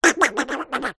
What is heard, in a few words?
cartoon duck mumble angry; zapsplat